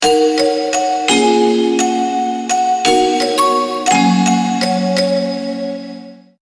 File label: 160 Melody Bell